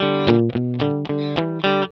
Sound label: rock G 110, Vintage Blues Guitar; USB Soundscan vol.22; AKAI; ROCK2